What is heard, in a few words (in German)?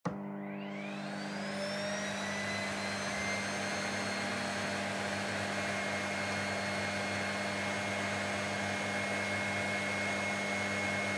staubsauger; haushaltsgerausche bilderbingo